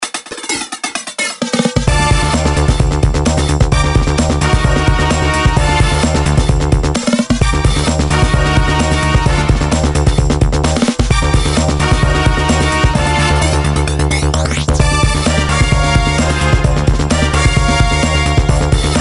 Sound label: Music; Street Football II; NDS